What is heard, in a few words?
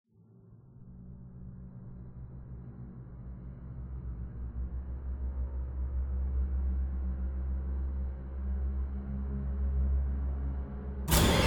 Horror Scare With Intro Long